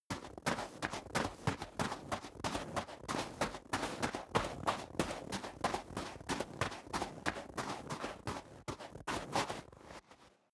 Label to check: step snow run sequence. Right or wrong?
Right